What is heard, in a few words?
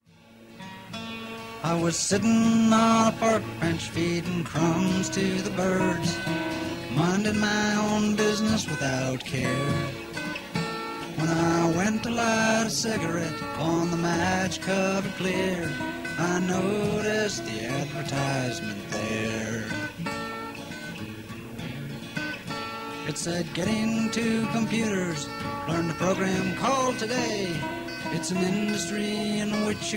Blues; BitBangers